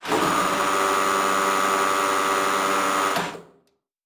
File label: servo big, sci-fi sounds, mechanical